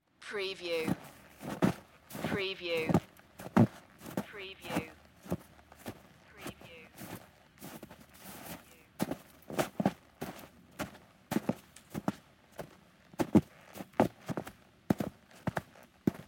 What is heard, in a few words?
Footstep Snow Boots